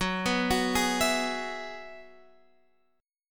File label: Gb 7th Suspended 2nd Suspended 4th, Guitar Standard; arpeggiated; x,9,9,11,9,12; JGuitar